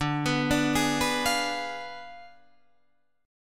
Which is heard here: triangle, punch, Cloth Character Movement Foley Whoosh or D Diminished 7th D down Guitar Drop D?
D Diminished 7th D down Guitar Drop D